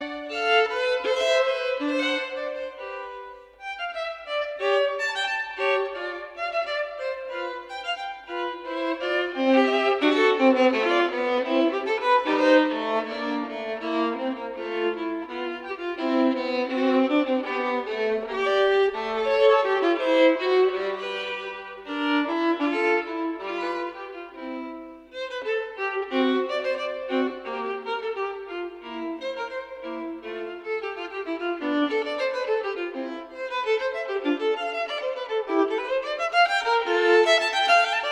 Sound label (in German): sonata III C-Dur BWV 1005 fuga